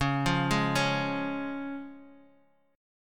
C sharp Major, Guitar, Open D; x,4,3,2,4,x

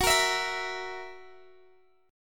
F sharp 7th, Guitar, Open D

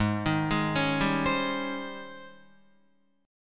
G sharp Major 11th G sharp, Guitar; JGuitar

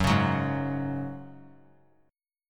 Gb Suspended 2nd, 3C,3F,3A,3D; Gbsus2 strummed; 18,20,21,18

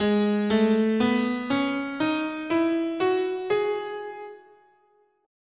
Ab Phrygian, scale

